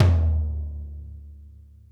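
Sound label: floor; Sonic Reality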